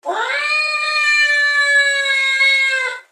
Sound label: 1 syllable sustained howl 1723Hz; sylvester the cat